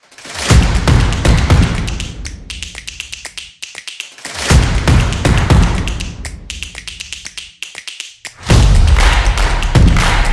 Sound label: The Sport Trailer